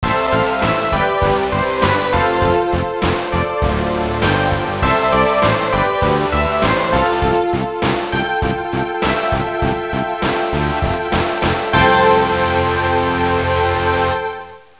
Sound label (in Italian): suonerie musica rock